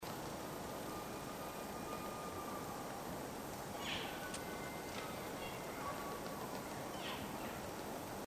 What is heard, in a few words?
cow mew, elk